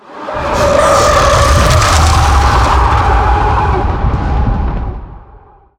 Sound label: Tau Sentient Vessel Scream; Warframe